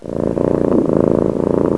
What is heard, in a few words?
gatti cats; animali